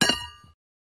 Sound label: Horseshoe Hitting